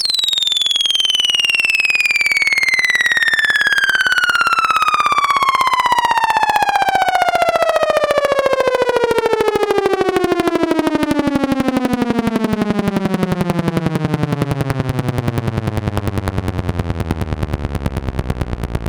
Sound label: FX, EMS VCS3